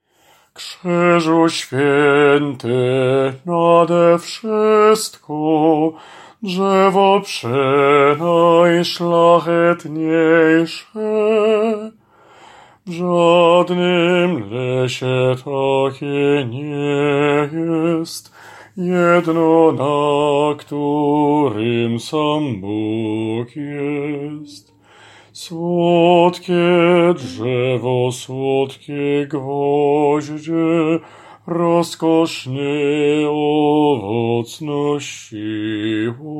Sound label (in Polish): BAS; Krzyzu swiety